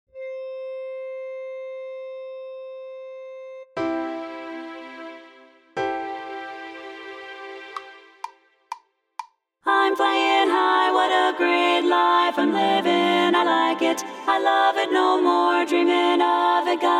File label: baritone, chorus